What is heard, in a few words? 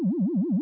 panel amb; Star Wars DroidWorks